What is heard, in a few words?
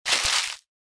paper wad